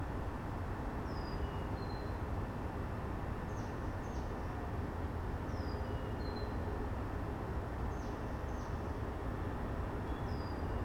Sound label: field recording